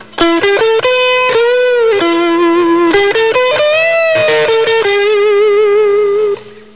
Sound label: lick